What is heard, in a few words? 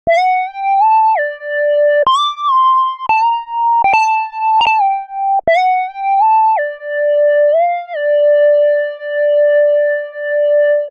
Model D D Minor 88 bpm; MIDI Clips; Tonal; Melodic; Ableton; Factory Packs; Chop and Swing